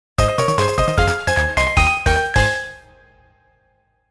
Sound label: Jingle; Poke Gear